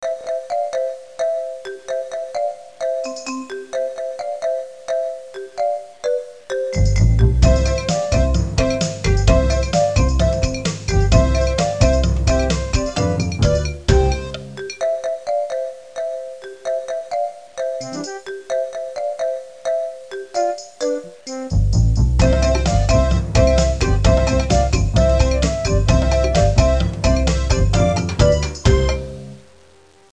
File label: Theme; Bible Fun Pack; Education Publishing Concepts